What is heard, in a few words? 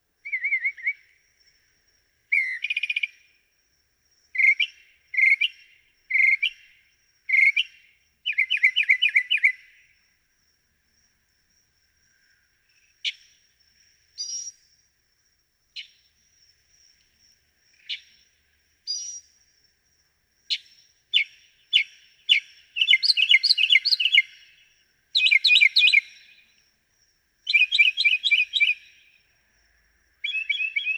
Northern Mockingbird